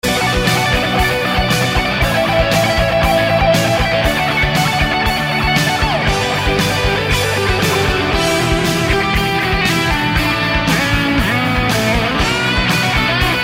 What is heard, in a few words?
guitar solo